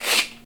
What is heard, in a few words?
short paper tear